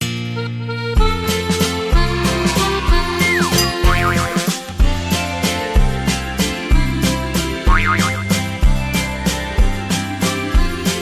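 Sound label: Funny Happy Birthday Dear Instrumental For Partytime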